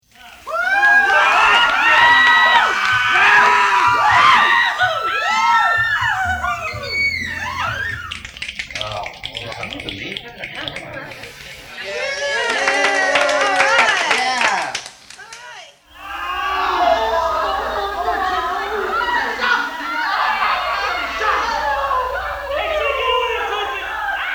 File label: A Weird Crowd (SFX montage)